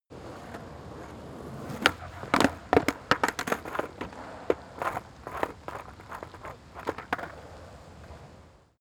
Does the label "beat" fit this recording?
No